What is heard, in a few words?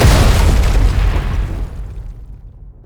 dragons descent land; LoL; shyvana